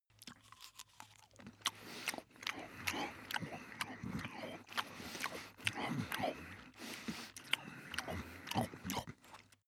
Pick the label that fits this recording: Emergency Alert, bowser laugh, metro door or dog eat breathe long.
dog eat breathe long